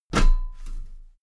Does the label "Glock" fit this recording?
No